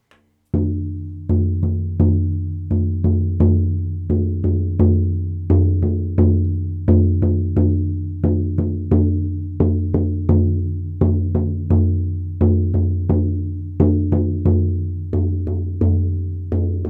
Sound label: Red deer Deer Heart, drum